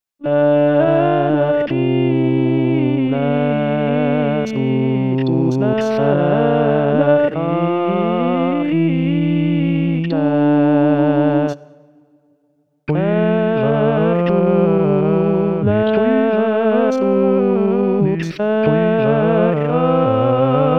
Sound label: benedictus, missa hercules; josquin; missa hercules dux ferrarie; secolo xv